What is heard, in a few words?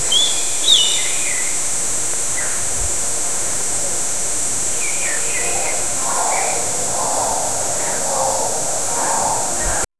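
a soft breeze flowing through, forest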